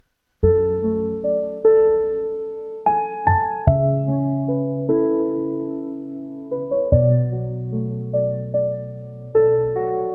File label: piano calm